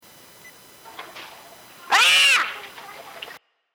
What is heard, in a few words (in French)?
Lion